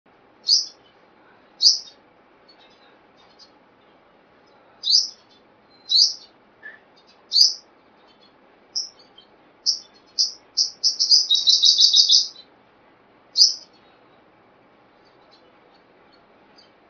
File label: Kolibri Sriganti